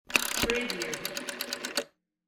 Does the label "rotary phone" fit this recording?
Yes